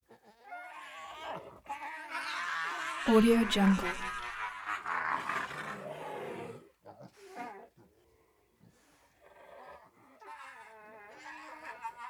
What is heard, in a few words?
hyena sustained constipated aahs laughs nasty